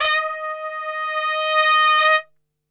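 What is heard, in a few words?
D#3 trumpet; Best Service; Brass Super Section; TRP SWL; 90 s Sample CDs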